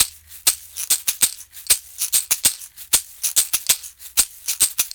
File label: Berimbau Shaker; World Percussion